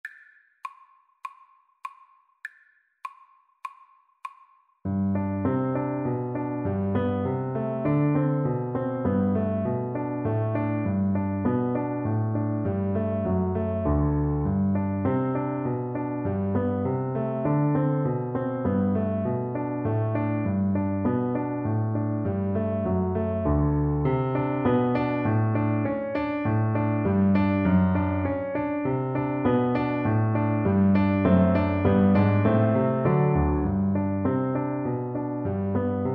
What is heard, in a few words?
melody, Recorder